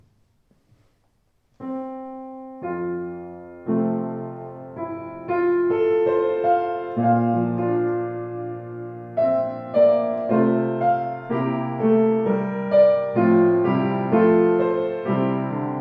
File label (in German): Sauter, klavier